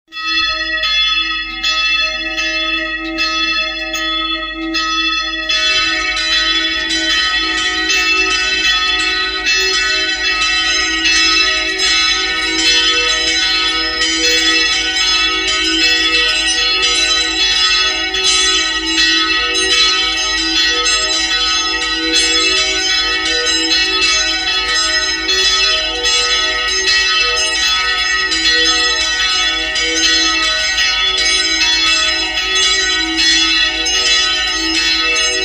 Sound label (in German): Glocken